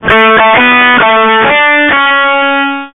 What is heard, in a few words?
ICQ Birthday